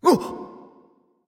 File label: nv breath; smite; xbalanque